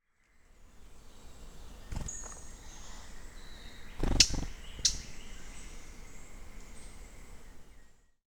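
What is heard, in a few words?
Yellow throated scrubwren, bird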